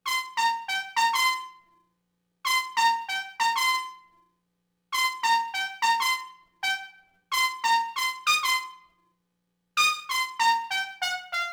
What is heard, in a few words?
trumpet; Matt Hoffman's Pro BMX 2; XBOX